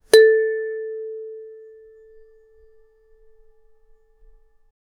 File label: a clean dry audio recordi, single note